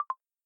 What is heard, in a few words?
send error